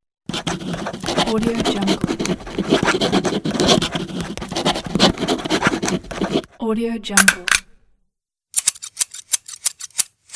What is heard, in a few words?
office supplies pack